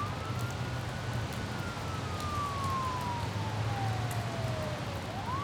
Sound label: rain loop